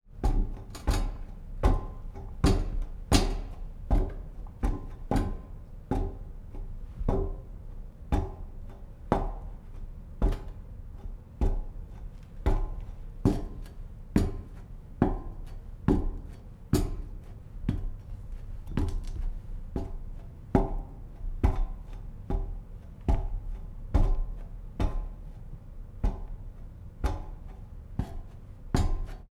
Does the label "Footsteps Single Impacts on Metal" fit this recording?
Yes